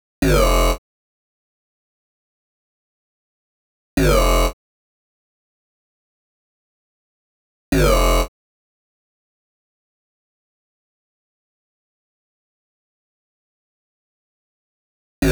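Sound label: 128BPM Talk Bass, F minor; Vengeance - Essential Clubsounds Vol.5; Mainstage Kit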